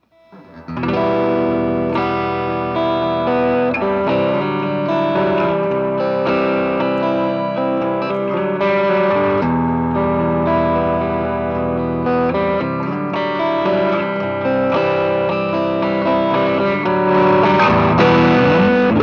DIRTY; PRS

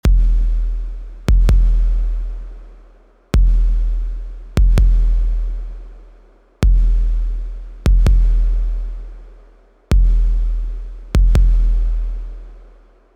KICK DRUM

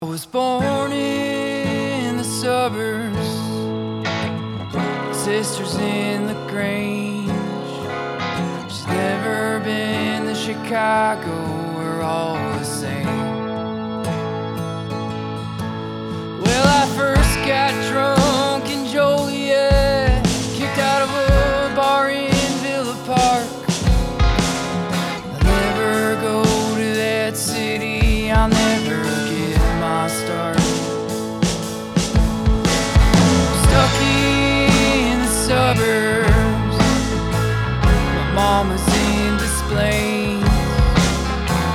full track no verb

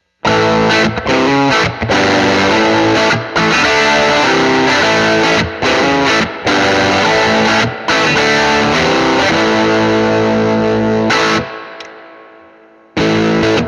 Sheraton Comparison Dirty

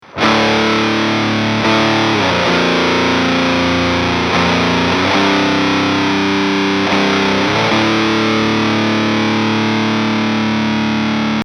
Chord 2 Wet

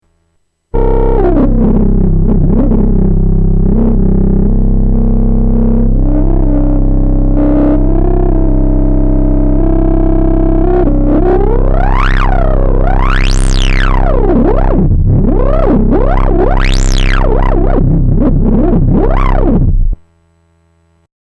osc rez

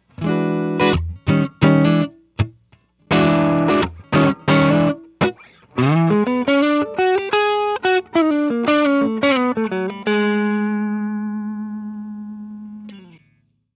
LOW GAIN 808

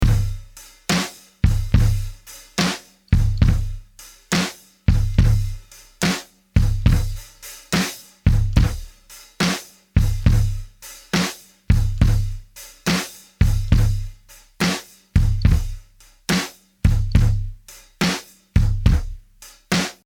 drums de verb

